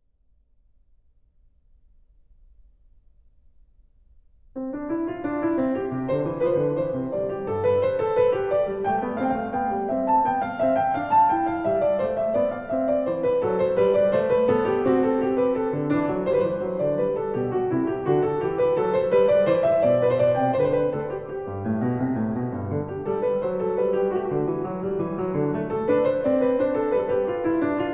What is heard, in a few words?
Invention No. 1 in C major BWV 772